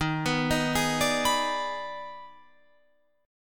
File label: D sharp Major 7th Suspended 4th Sharp 5th, down Guitar Open F; D#M7sus4#5; arpeggiated; chord shape